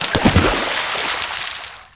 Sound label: fallwater; Tony Hawk's Project 8; XBOX